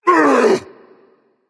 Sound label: grunt hit berserker; cuchulainn